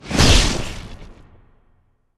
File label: sfx thunder; thor